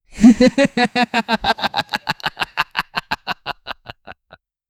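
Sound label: badass chuckle; god creator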